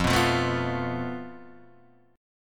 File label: F Major Flat 5th; FMb5 Chord {1 2 3 2 0 1} strummed